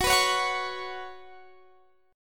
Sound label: Gb Suspended 4th Gb, down, Guitar, Standard; strummed; JGuitar